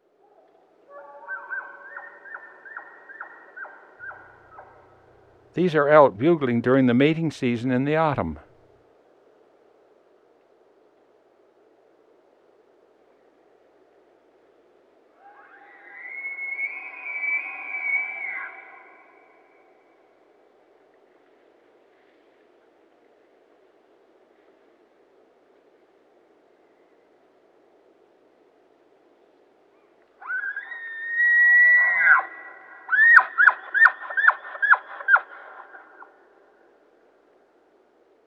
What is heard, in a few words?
Elk; Western North America; Dan Gibson; Wildlife Identification By Sound